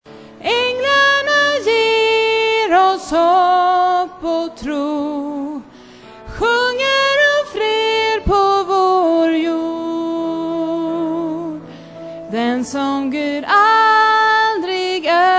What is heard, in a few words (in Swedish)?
sopran